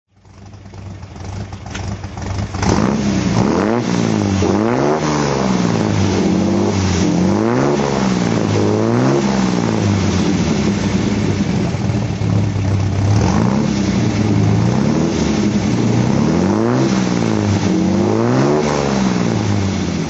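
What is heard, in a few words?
Lagonda throttle; lagonda brookl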